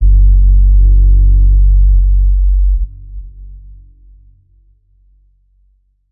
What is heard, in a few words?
Button Press Low Sub Sw